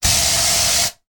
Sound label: household air freshener aerosol can spray medium